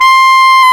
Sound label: WND HARD, Clarinets, Clar Bryt